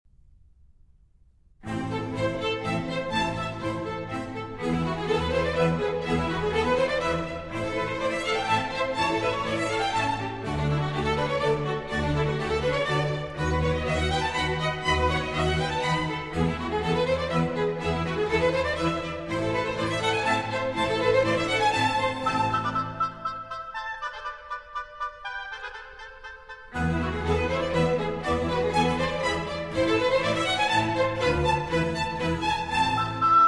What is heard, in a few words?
Concerto for 2 Oboes, Strings and Continuo in A Minor